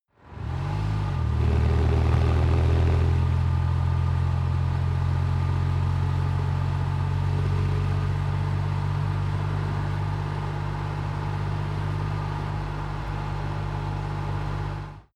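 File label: sports car interior engine accelerate; verkehr-transport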